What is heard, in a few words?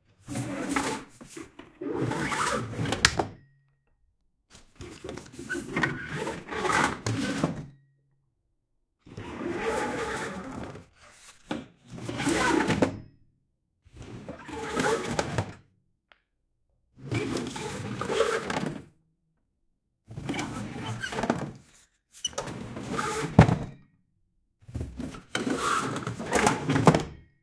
drawers sliding someone r, rummaging